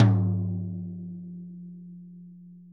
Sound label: ROTOTOMS; Sonic Reality - Vintage Timetraveler